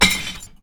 Broken Bottle Verre

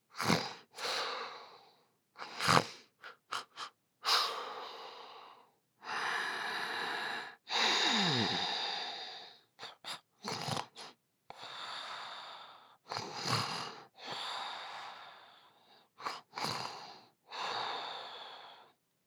Snoring

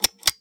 Pull Chain Switch

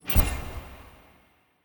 Horror Stinger Memory Flash

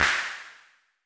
Tonic Electronic Hand Clap

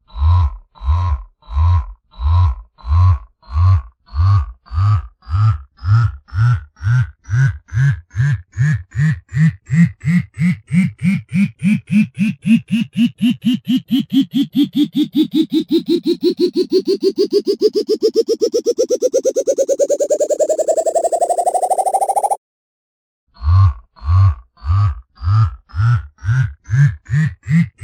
Vibrating Alert; Donalfonso

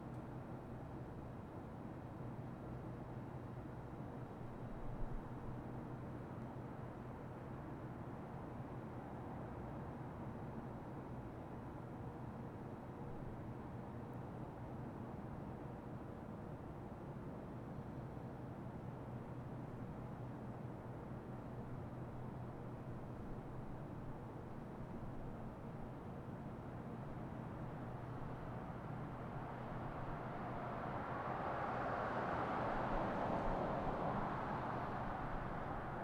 City Street

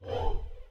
Whoosh Woow

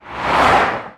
Hi Speed Car

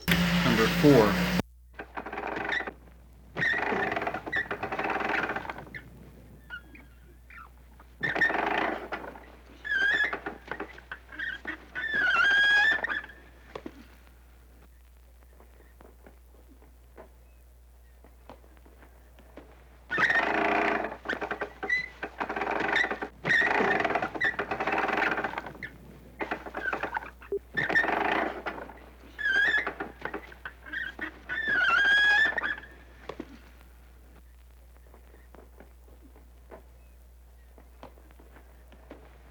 Wood Pulley Creak

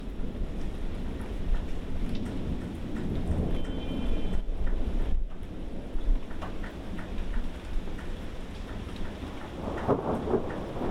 Thunderstorm

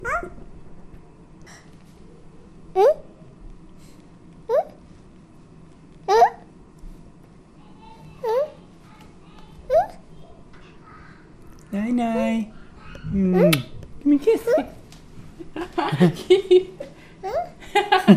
Baby making sounds

